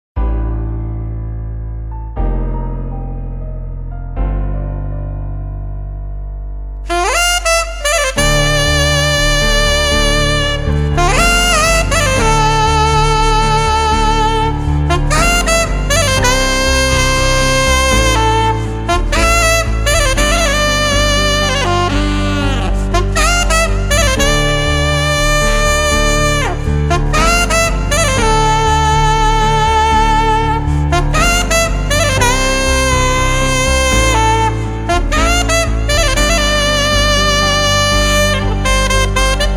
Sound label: Saxophone